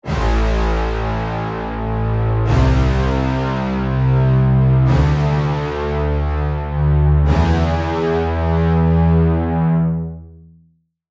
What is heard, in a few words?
lead, Sylenth1; Routed Sound; Sylenth1 Expansion - Street Vanquisher Vol.1